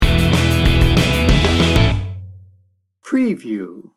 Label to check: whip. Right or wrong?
Wrong